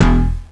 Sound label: PICK; Descent II